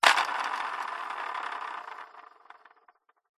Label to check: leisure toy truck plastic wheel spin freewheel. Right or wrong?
Right